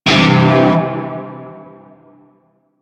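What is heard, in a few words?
Shawzin Pentatonic Major Chord G; Music; Warframe; Narmer Shawzin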